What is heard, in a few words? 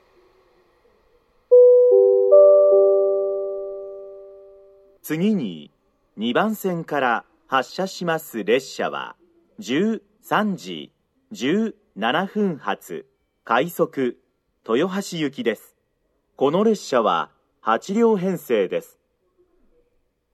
rapid; owari-ichinomiya; toyohashi; jr-central